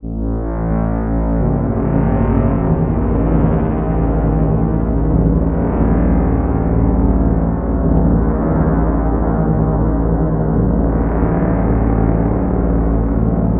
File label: Demons Pad; Sonic Foundry (Sony Creative Software); Loop Noir